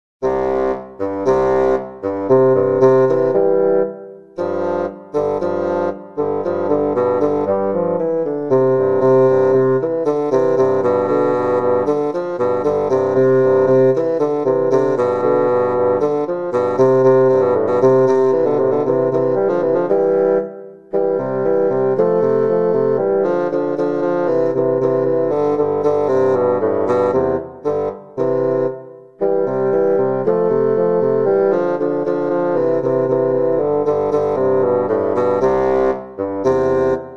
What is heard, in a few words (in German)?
Fagott